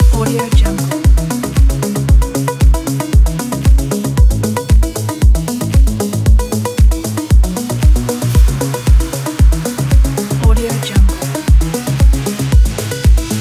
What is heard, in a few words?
scientific innovative technologies; intelligentmusic